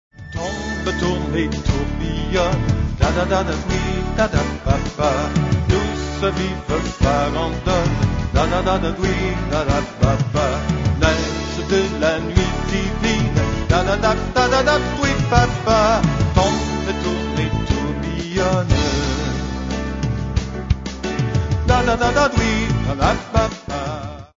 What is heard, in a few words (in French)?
farandole; noel